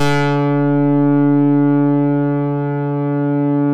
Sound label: EMU MODULAR, modular syn